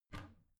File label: bathroom cabinet both open soft